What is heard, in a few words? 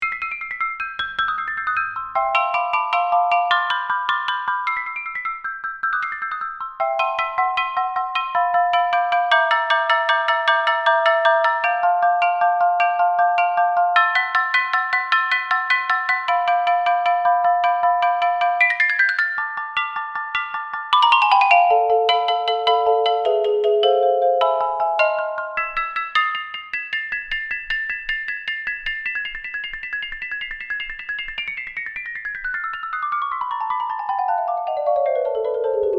etude c soft